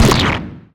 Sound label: potato explode